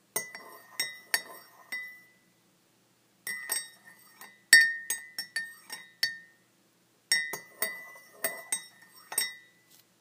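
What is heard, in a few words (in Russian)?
stirring tea with a spoon; bytovye zvuki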